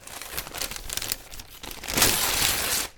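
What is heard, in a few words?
tear news paper; 新聞紙破る１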